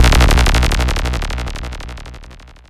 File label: Cwejman S1 Spiny Plastic Bassline